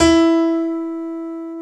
PIANO, E; Best Service ProSamples vol.10; House; AKAI; SY PIANO

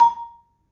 Marimba, A#3; Terkelsen

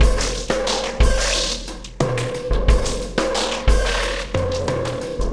Oscillation Beat

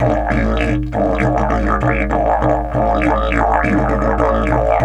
DIDGE 100BPM; Big Fish Audio - Didgeridoo and Other Primitive Instruments

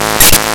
SPARK

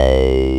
MATRIX 12, OB Synths

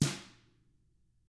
TAMA BR MF, Drums; ILIO; Double Platinum Drums 1

